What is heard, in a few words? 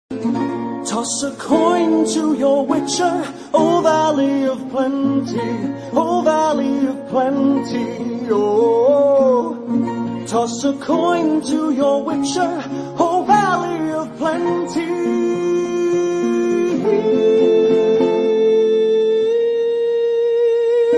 ringtone